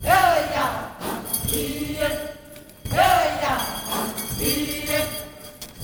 KUZIMA CHNT; Spectrasonics; Heart of Africa 2; MADZI KUZ

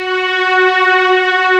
Textural Strings; E-MU Producer Series Vol. 2 – More Studio Essentials; Composer

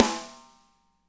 MED SNR; SNARES; Spectrasonics - Burning Grooves CD2

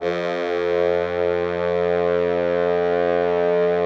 French Horns, F1; Best Service ProSamples vol.51 - Classic Orchestra 2

